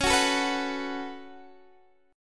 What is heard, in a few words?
Db 7th Suspended 4th Sharp 5th Db down Guitar DADGAD